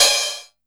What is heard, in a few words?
hat, drum; Masterbits - Total Zone